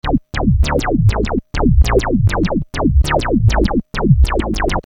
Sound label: Syn Bass 100 D, Techno Synth Loops; Sonic Foundry (Sony Creative Software); Signals I